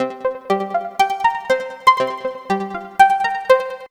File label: Synth, Synth Textures